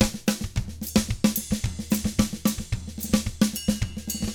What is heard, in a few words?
SMBA, 110 SAMBA, Drums